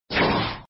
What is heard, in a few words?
monster